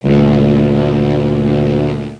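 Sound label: ferry blast; Sound Bar; Midisoft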